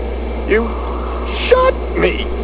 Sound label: shot